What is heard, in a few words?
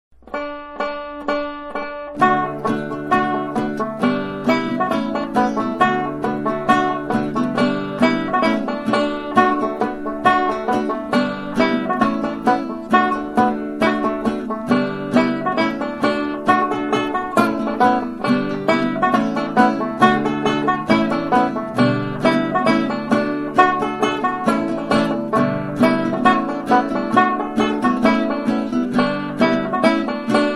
polka; peg ryans